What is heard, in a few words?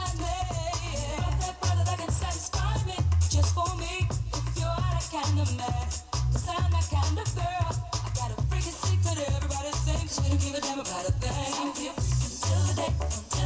great tune